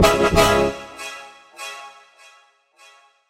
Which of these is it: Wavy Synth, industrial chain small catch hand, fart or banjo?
Wavy Synth